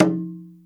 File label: WAYANG KULIT D6, Heart of Asia; Spectrasonics - Heart of Asia CD2